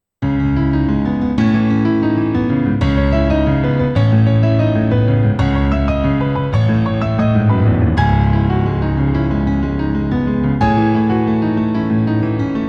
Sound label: Piano